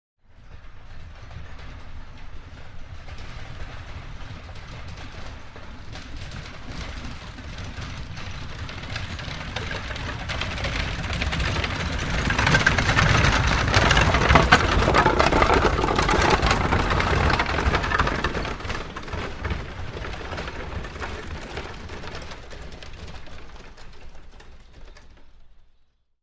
Horse Drawn Carriage By Fast; Horses Carriages and Traps; Hollywood Edge Historical Series

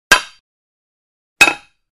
Metal Impacts Dull Dull Impact With Medium Thud; Hollywood Edge Foley Sound Library

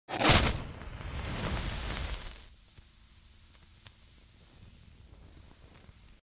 Match Strike & Ignite, Household

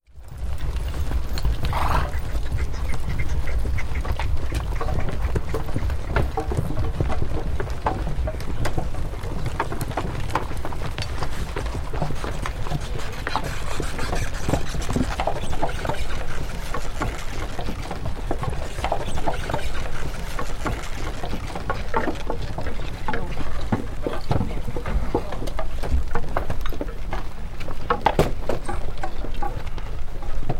Infantry And Cavalry Move Into; Historical; Hollywood Edge Historical Series; War Horses Armies & Weapons

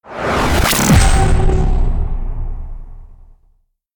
impact

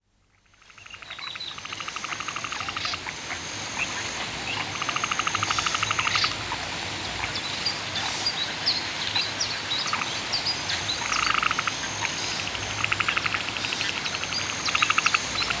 Canoeing On a Lake At Night, Nature Sounds; Dr. Sound Effects; 210 Nature Sounds - 20 Hours of Relaxing Natural Ambiences for Meditation and Sleep

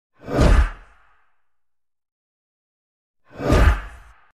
Quick Whoosh Punch; Hollywood Edge Sound Designers Tool Kit

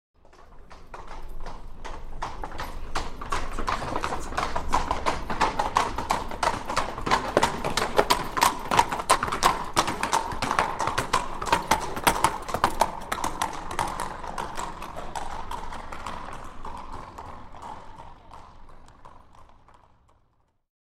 Two Horses By On Cobbles; Historical; Hollywood Edge Historical Series; War Horses Armies & Weapons

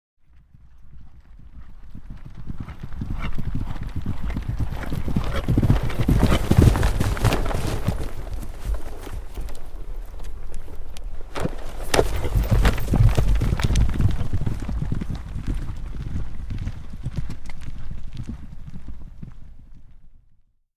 5 Horses Canter Up Stop and A; Historical; Hollywood Edge Historical Series; War Horses Armies & Weapons

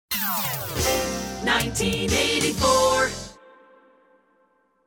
Hits, Drops

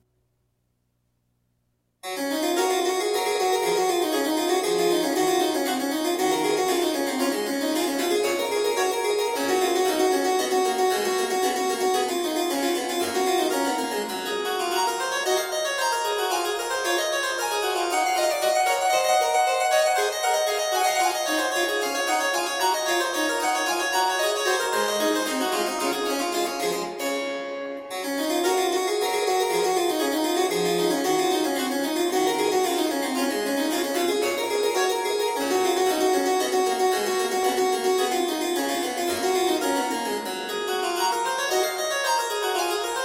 Variatio 5 a 1 ovvero 2 Clav; Bach Goldberg Variations

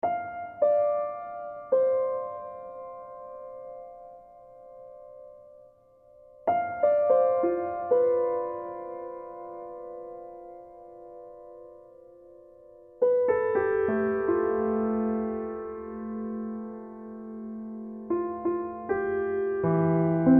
Piano

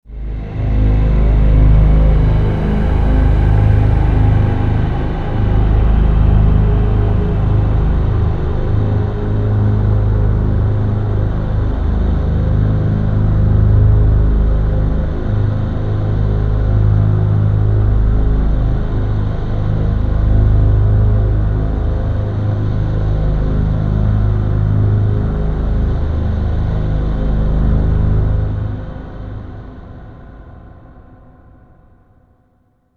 Danger Drone, Suspense & Drama; Immediate Music

